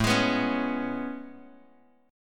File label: A Major 9th A, Bass Standard